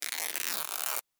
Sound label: Cloth Ripping; Survival Game Sounds - Lite Edition; Objects and Items